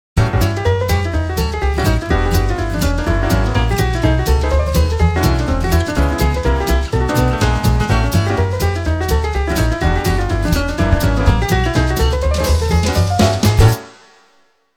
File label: Jazz ringtone; Nokia; N78; Audio themes